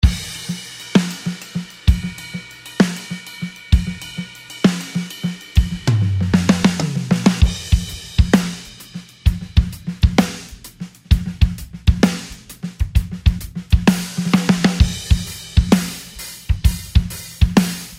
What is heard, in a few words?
130 bpm 4-4 busy cymbal shuffl